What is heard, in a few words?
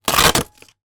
office printer label dymo 4xl label tear from printer fast